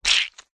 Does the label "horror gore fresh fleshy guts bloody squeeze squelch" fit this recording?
Yes